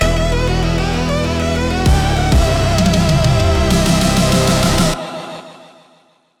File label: jingle cool; 効果音・ジングル(ショートBGM)